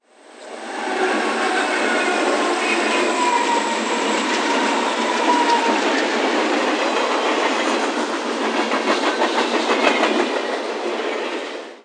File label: train pass